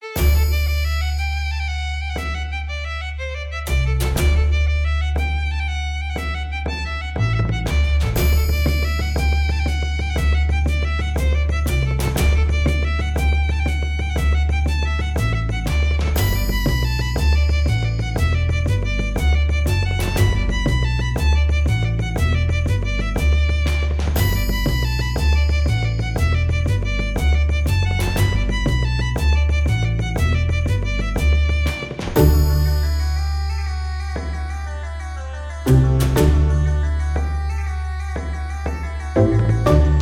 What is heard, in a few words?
Marche